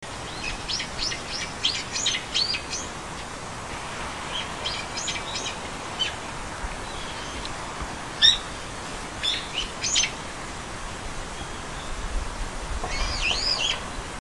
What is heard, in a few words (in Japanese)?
hiyodori, bird; ヒヨドリ （鵯）; 庭園渓谷の楓の梢の群から、 鳴き声; 蘭梅山; 野鳥